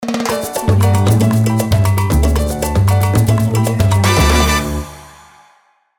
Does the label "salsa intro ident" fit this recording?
Yes